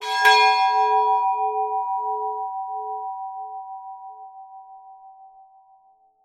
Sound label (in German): Glasen